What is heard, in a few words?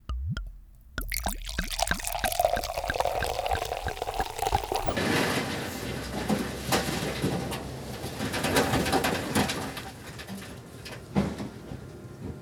soda dumping morph; fftsplice